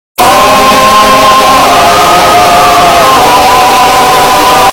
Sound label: aaaaaaaaaaa loud